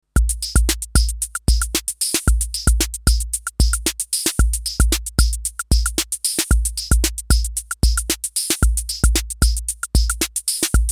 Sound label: TT 78 Beat Bot Shuffle Modes